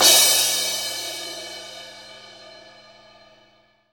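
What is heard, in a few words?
cymbal crash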